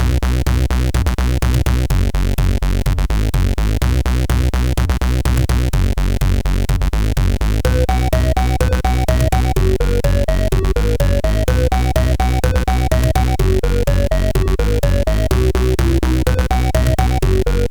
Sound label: A Quick Tune, sid CSG8580R5; hvsc